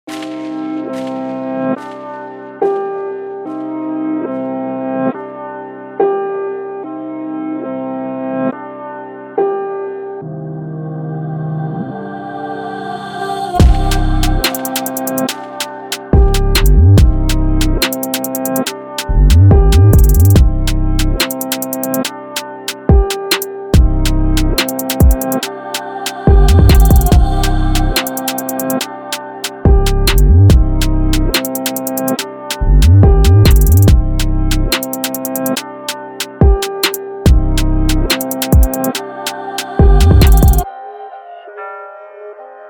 drill hard hip hop; big fish audio

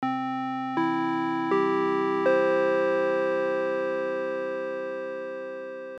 synth; sm-271 calculator synth

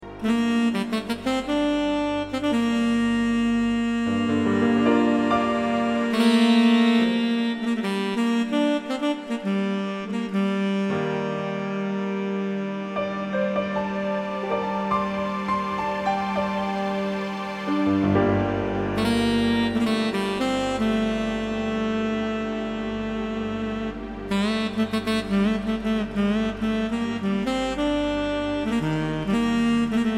Blues impro

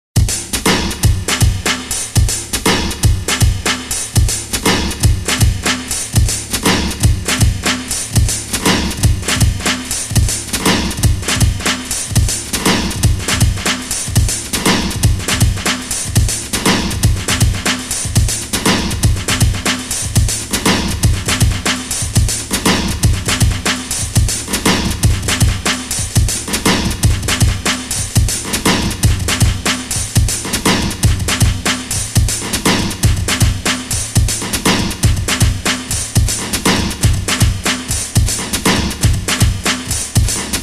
Phase Shifter Breakbeat Phase

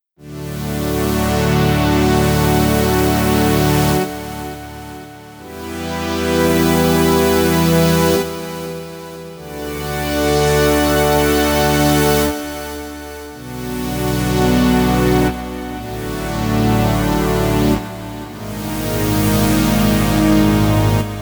pad fade in; the transmitter soundset

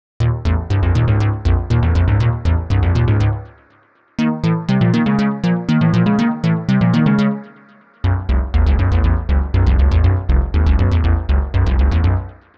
forward beat; the analog sound waves

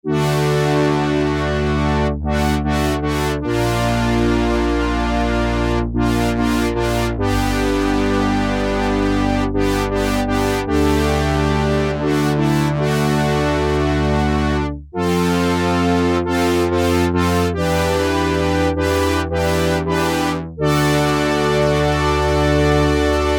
Brass, Moog MemoryMoog; Factory programs

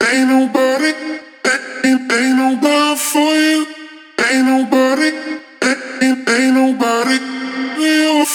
vocals dry; folda; sentinel av

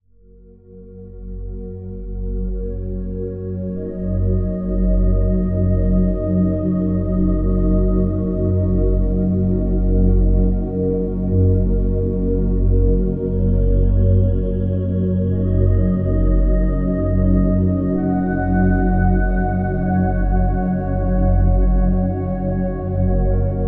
Meditative wash effects

